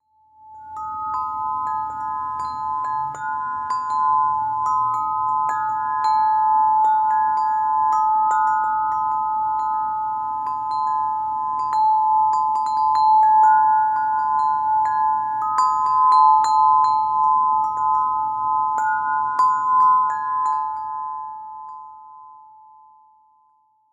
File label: Chimes; SolarSinger